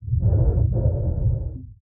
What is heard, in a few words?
Drown Male